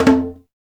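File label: conga low, Latin; Roland L-CD701; PRC Latin 1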